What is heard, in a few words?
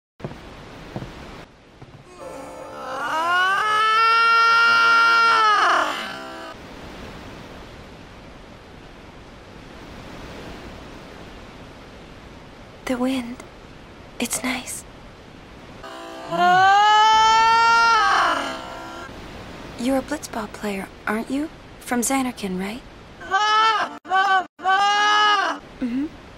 aaaah